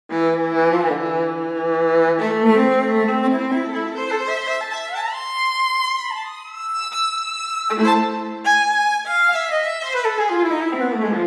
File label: Amati Viola Solo; Native Instruments Amati Viola v2.0.0 KONTAKT